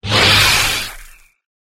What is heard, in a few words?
saber hit; Sabres Laser